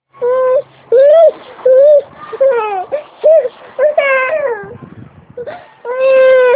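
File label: baby hungry, male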